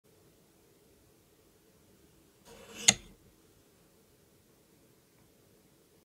abacus; چرتکه

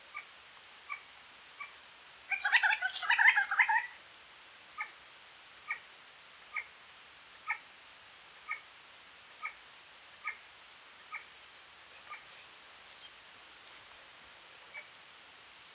Resplendent quetzal

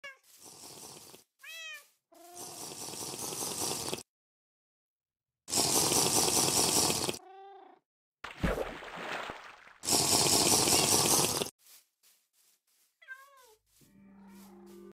Drinking water; Minecraft

